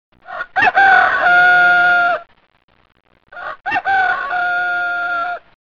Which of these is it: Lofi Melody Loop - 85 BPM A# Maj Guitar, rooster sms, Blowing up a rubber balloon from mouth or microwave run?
rooster sms